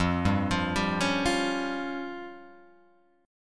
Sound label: F Suspended 2nd Sharp 5th F down Guitar Open G